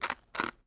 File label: eat; ema